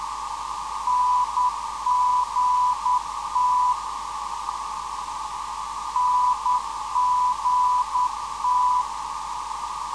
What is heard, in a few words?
NGT 478kHz